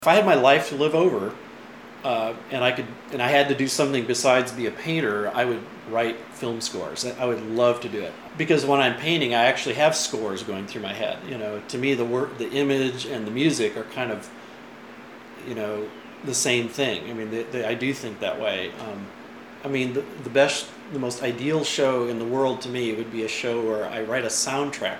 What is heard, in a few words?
music